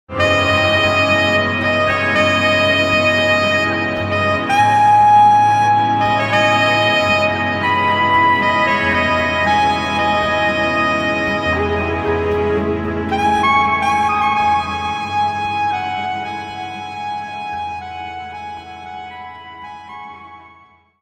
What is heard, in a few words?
saxophone ringtone instrumental ringtones